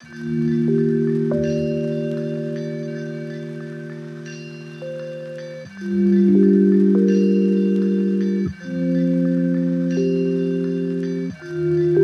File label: lofi hip hop